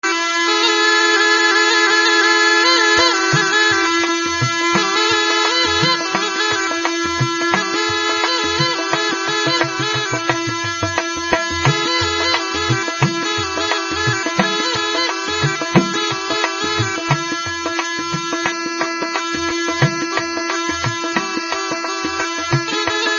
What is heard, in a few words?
instrumental violin ringtone; king cobra